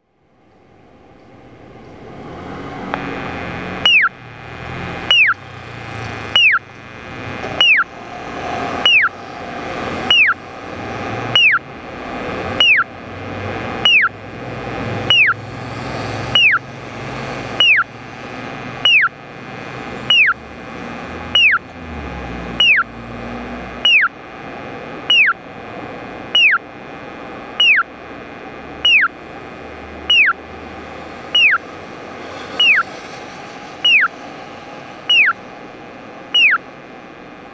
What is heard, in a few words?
piyo; koito MP-I-2; kyoto minami kokudofudanotsuji; onkyo kosaten